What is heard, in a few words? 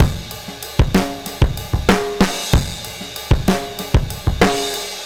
Funky Drums 95; musicradar